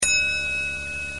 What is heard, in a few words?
elevator ding; hotel; angelsNdogs